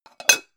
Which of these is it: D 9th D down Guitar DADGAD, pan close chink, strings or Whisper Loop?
pan close chink